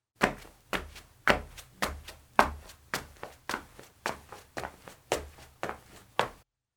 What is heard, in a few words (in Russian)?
zvuk shagov